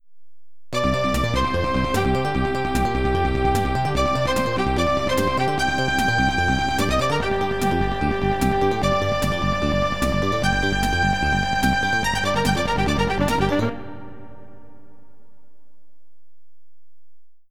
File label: Roland MT-32; darts